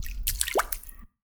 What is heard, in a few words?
Water; TRUTH-HZ; Crowdsourced Sample Pack